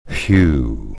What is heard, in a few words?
whew